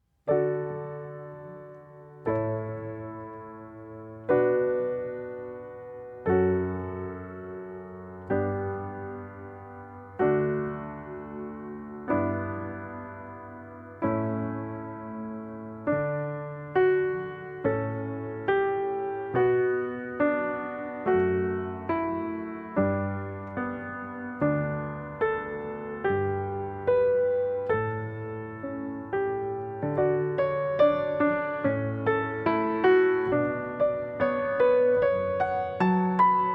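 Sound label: Canon in D major, piano; Pachabel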